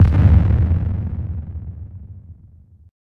Explode

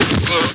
BLOCK; SOMC Family Forum

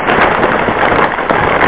out of road; WRECKAGE

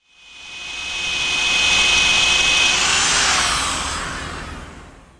power drill; SGI O2 Out of Box Experience 2.2

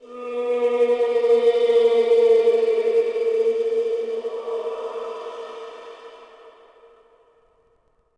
undead; DAoC; Toa; Gamestar

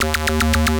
turret rings; Earth and Beyond; EA Games

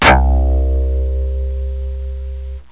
digital bass; Octamed 6; walkabout

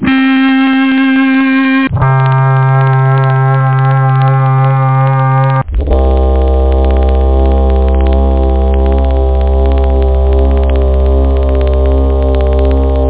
e vibrato, guitar; da capo vol1

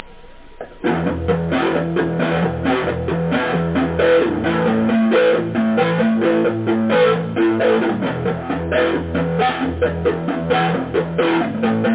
flan, Guitar

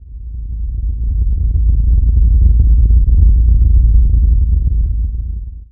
Ship out; ImpGal2; screens start

